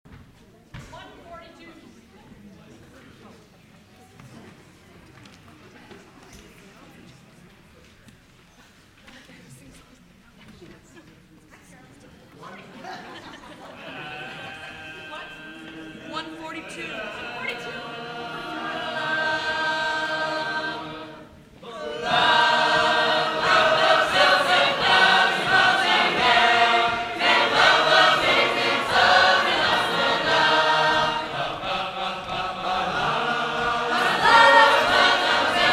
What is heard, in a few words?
Sacred Harp; Portland; 2007 Convention; Stratfield